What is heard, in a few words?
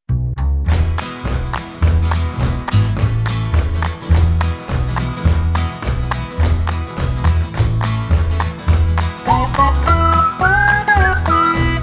Sunny Day Instrumental